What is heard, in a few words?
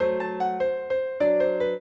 piano; Mozart